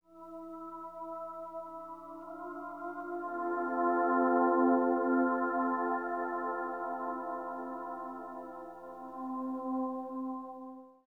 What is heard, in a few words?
PAD, Hip Hop and R&B; Best Service ProSamples vol.02; AKAI